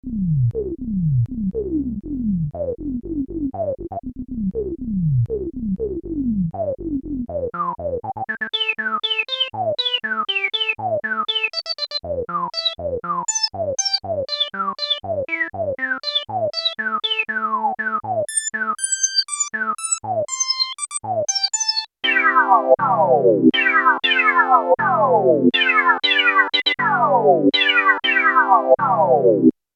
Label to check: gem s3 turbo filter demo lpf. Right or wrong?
Right